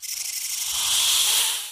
symbol snake; games; Aztec Gold Megaways

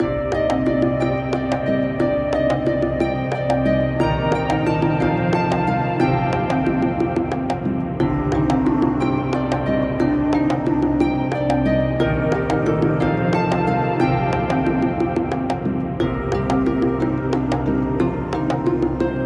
gambling ambient; games; Fenix Play 27 Deluxe; Wazdan

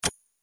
reelspin click; Cocorico

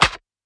spin start; In The Forest; Wazdan

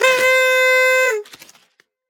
win trumpet; Hot Party Deluxe; Wazdan

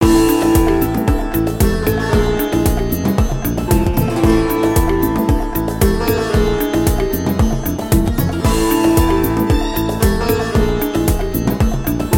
freespin melody; Diamond Monkey

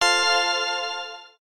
autoplay on; Hot 40

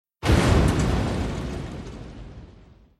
fire; Brilliants Hot

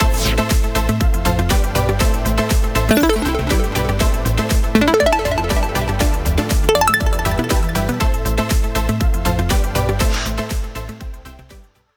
megawin; Magic Stars 5; wazdan

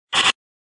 card give; games; poker; American Poker The Legends II

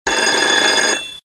RING SHORT; Happy Holidays

